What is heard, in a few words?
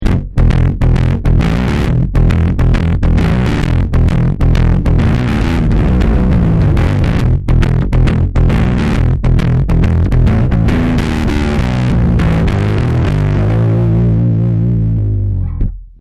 more heavy